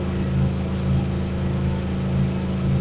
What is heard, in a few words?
machine; Mindustry